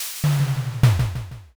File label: fridge